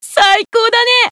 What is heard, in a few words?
reloading; svencoop; player sounds; lappland